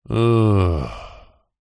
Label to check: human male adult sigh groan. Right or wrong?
Right